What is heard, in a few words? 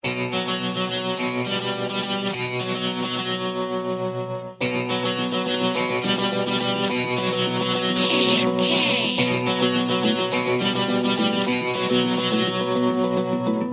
Instrumental